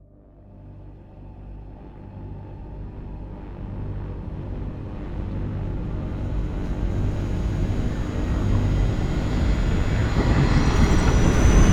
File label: charge; FTL; mono